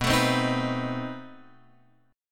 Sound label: B 7th Flat 9th B down Guitar Open F; B7b9 strummed; JGuitar